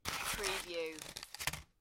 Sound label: Ball of Tin Foil; beanstalk audio